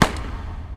m3 single; WAR

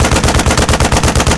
50cal loop; WAR

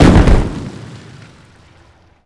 medium impact generic; WAR

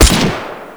light rifle shot; WAR

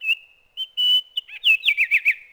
oriole

